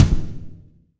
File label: New Rock Kick; Roland BK-5